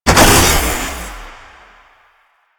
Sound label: Jump Pad Launch; Warframe; Jade Shadows; Ascension